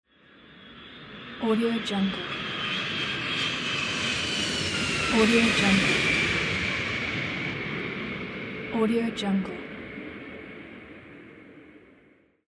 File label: plane